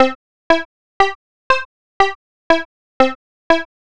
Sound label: synth hard sync